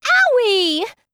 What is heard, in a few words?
owiee